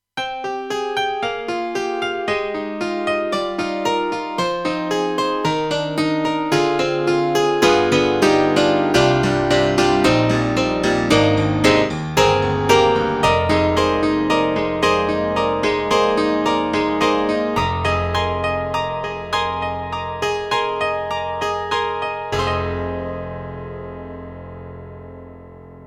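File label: KORG X5DR Hammer Piano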